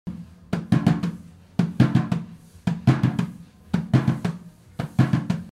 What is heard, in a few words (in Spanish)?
Tambor